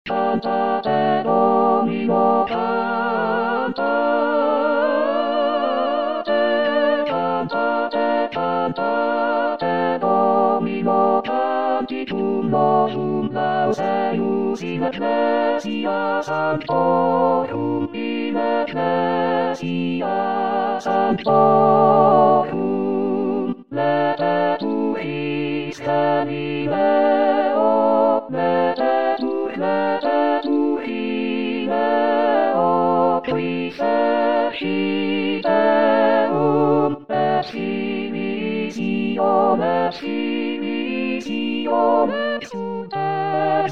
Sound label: Tutti cantate domino; Pitoni; Giuseppe Ottavio